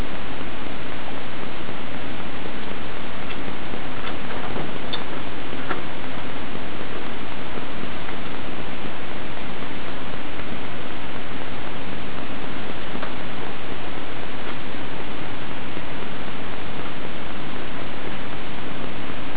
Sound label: upstairs movement